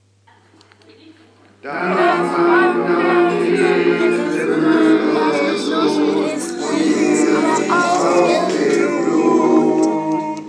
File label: foolin around with voice loop app